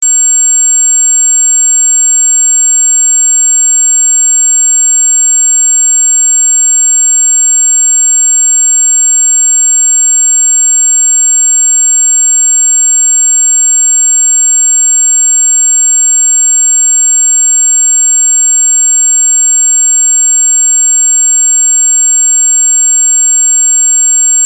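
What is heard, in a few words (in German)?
Sägezahn 500 Hz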